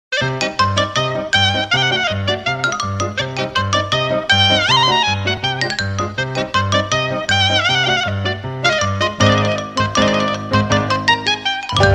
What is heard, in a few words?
music idol carry; urn; Kirby Air Riders; tiny boxes item bounce